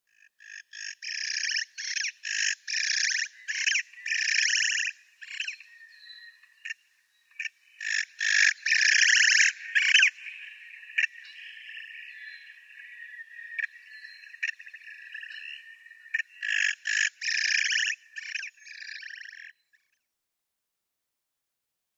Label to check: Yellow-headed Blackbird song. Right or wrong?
Right